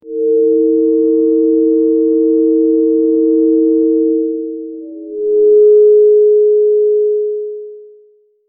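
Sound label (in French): sort de soin très rapide, healing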